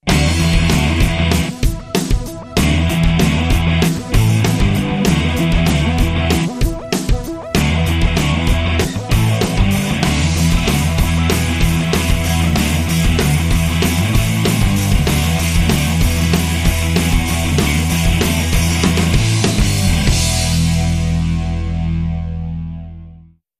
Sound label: Rock